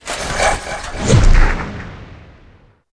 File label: death giant attack